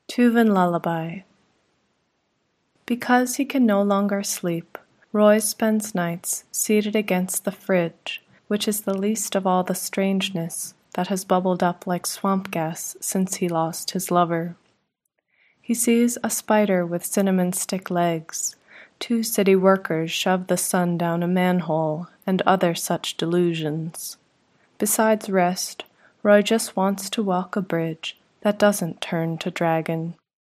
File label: lullaby